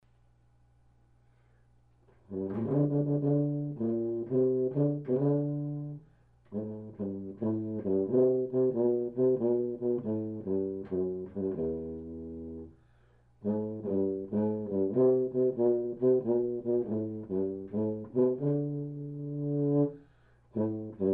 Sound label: tuba; ffvictory